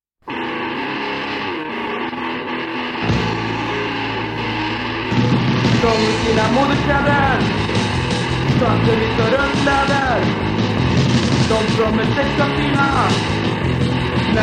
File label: Noise Reduced